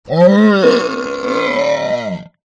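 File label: animal dinosaur vocalisation growl; zapsplat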